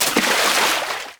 Bodyfall Deepwater; SFX Kit; Bodyfalls

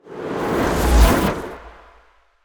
Ice Spell Mobility Teleportation Frost Blink; Mobility & Teleportations; Arctic Aura

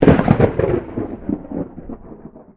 thunder mid; Apotheorasis

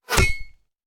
Bullet Impact; Sound Ideas; Guns

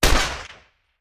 Rifle; Sound Ideas; Guns

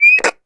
Walkie Talkie Feedback; 1001 Sound Effects

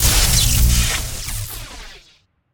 Lightning Spell Impact; AudioWizards

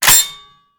Shotgun Foley; Sound Ideas; Guns

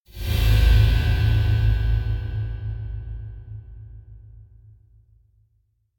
Metallic Impact Occult Finale; Hollywood Edge; sound designer's toolkit v2

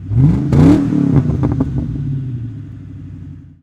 Mustang Rev; SFX Kit; Automobiles

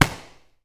Boxing Slap Stick

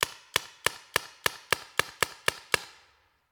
Paintball Gun; Sound Ideas; Guns

